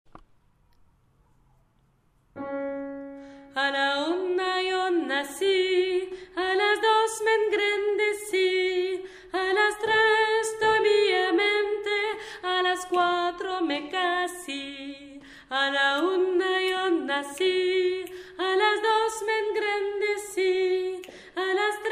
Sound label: alto; a la una